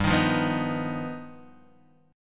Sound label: G Augmented 7th, Guitar Drop D